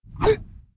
swordman attack; propack

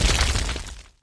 air hit; propack

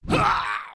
daemon attack; propack

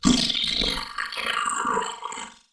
ghost armor die; propack; magic

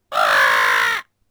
axe thrower die; propack

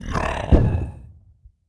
behemoth die; propack; magic

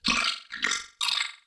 ghost armor die; propack; magic

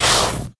dr attack, dragon rider; propack; magic

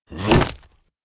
behemoth attack; propack; magic

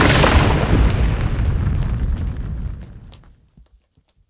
tech building fall; propack

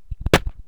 stickfighter attack; propack

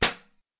archer attack; propack

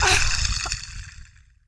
dr die, dragon rider; propack; magic

